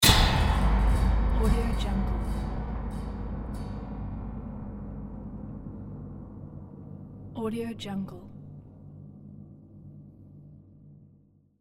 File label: abrasive